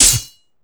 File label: GAME DAMAGE; maimai